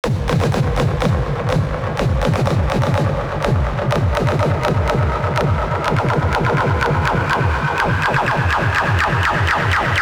Lasered 124 BPM, Risers Uplifters

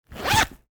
Zipper

